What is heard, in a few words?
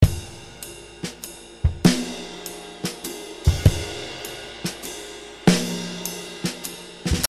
6 8 Loop